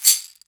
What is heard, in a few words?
Caxici, EMU Orbit-3 drum machine; 300 Drum Machines